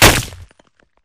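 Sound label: Bullet Light Ballistic Concrete impact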